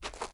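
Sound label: shuffle, footsteps hard surface jump up; grunt footsteps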